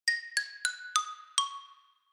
xylophone

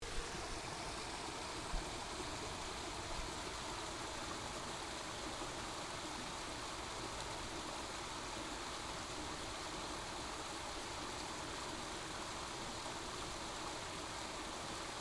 waterfall; Kinuito

